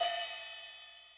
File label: hi-hat, Millenium MPS-400